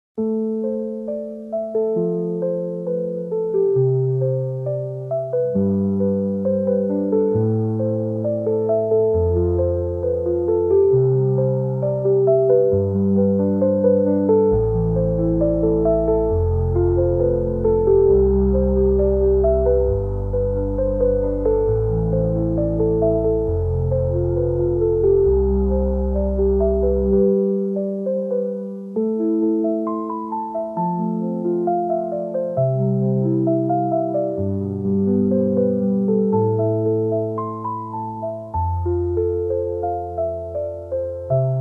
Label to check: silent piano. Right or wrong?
Right